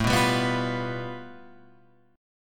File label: A 7th Suspended 2nd Sharp 5th, Guitar, Open F; A7sus2#5 strummed; JGuitar